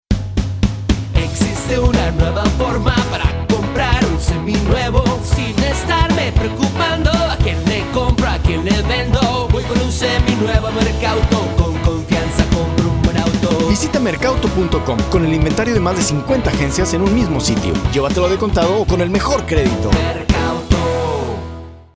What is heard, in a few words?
Jingle; Merkauto